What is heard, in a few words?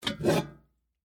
Pot Pick Up, Household, From, Wood, Full, Small, Metal, Antique; BlastWave